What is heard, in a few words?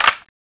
clip out; tfa cso; norinco 86s